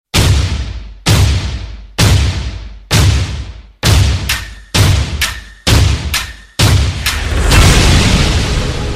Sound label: Drumz Blaster